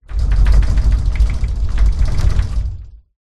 household cupboard door wooden sliding loose shake